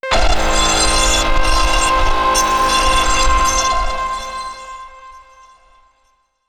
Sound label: intro Action Boss; PunkGirl